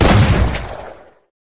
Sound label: shotgun fire, weapons